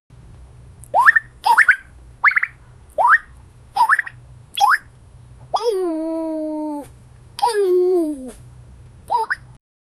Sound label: cartoon turtle; dinosaur